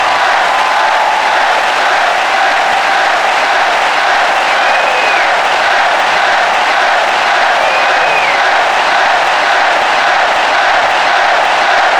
Outro Audience Cheer Loop; Laser Disco Defenders; PS4

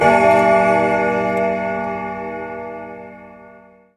clock chime; birthday party bash; wii; Spanish

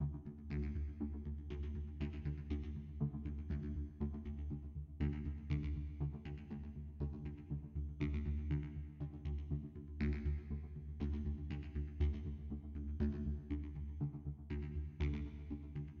Amb Arp; Worms Rumble; PS4 PS5 Switch Windows Xbox One Xbox Series XS

